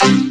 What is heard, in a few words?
VOCODER DRUM; Rubber Chicken Software Sample Bank; DRUMS + PERC